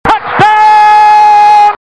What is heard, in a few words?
grenade; css